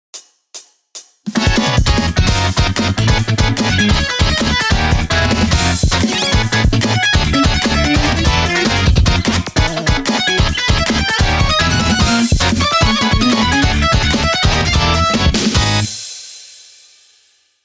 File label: drummer